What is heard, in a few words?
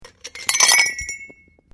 GLASSES DROPPED CARPET, Kitchen glasswear; Sound Effects:Bars; Foundation3